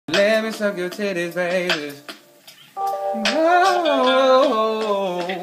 breats; ddg

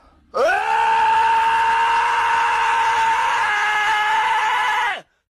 old man scream; meme

